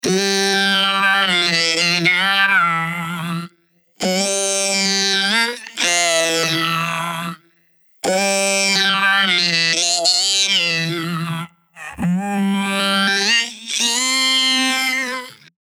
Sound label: Vocoder